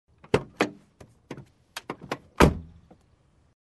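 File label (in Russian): звуки дверей автомобиля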